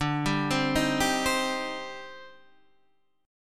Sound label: C Minor D; Cm/D arpeggiated; JGuitar